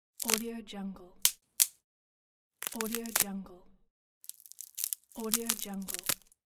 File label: crunch branches